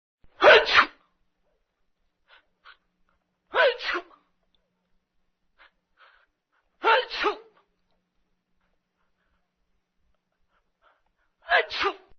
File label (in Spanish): Sonido Estornudo Efecto de sonido